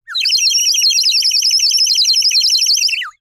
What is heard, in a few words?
Bird whistle, clay, painted, water, airy, wispy, flurry, very strong, insanity, short; Eiravaein Works; Vaeyan IV